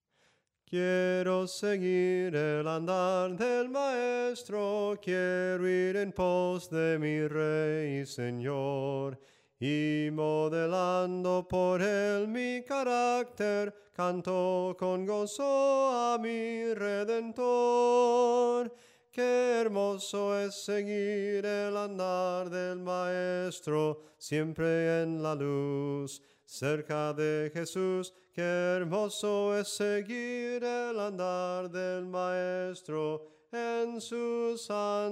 SOPRANO CANTADO

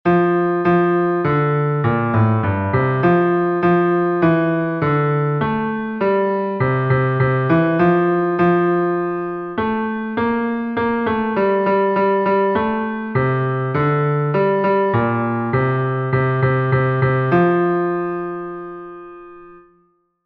bajo, himnos en piano; cantos del camino

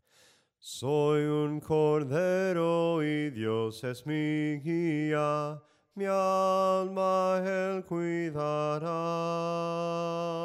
SOPRANO CANTADO; SOY UN CORDERO

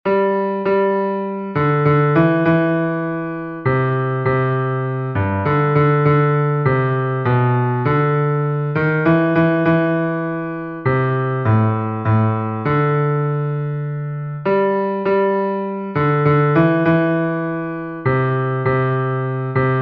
BAJO, himnos en piano; MARAVILLOSO ES EL; cantos del camino

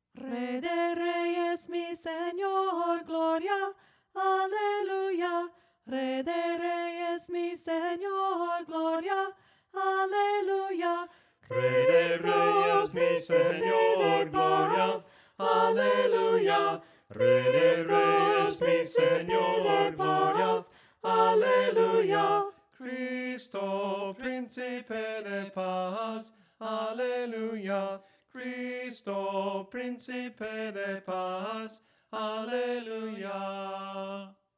VOCES, himnos a capella; cantos del camino

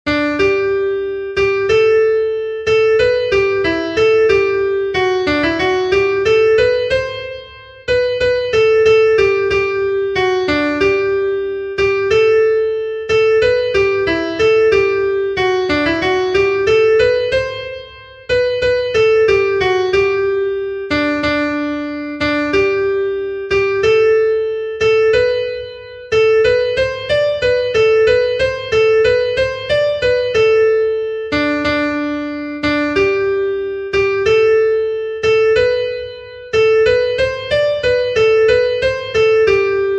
SOPRANO, himnos en piano; cantos del camino

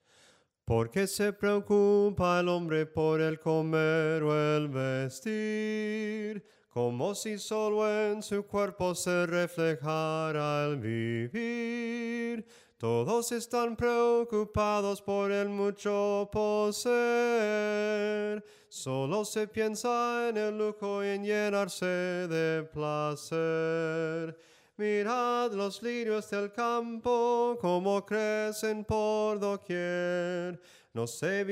SOPRANO CANTADO; cantos del camino

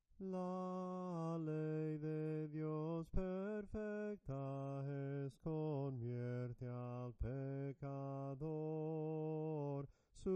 SOPRANO CANTADO; LA LEY DE DIOS PERFECTA ES

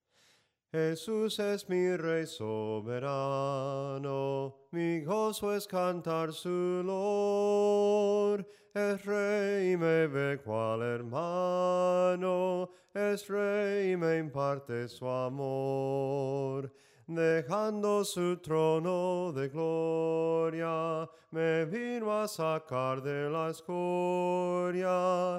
SOPRANO CANTADO

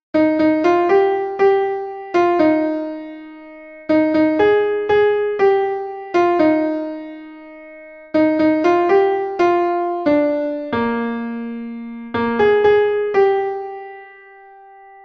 SOPRANO, himnos en piano; cantos del camino

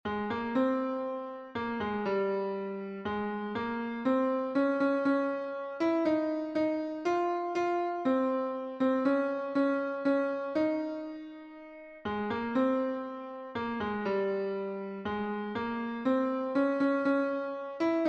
tenor

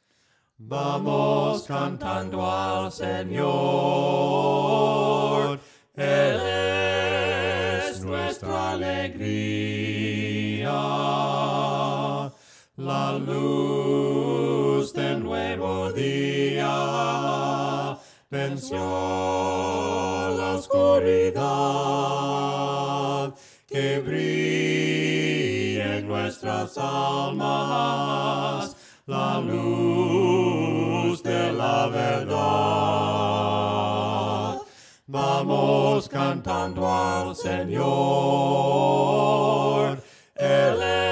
VOCES; VAMOS CANTANDO AL SENOR

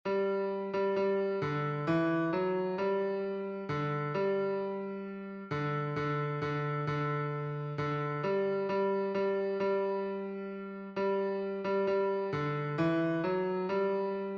BAJO Piano, himnos en piano; cantos del camino

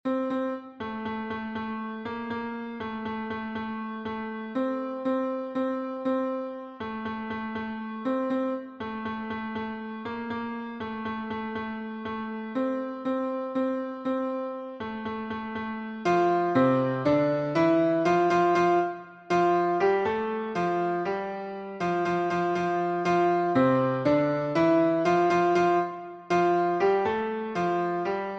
TENOR, himnos en piano; CANTEN AMEN AMEN; cantos del camino

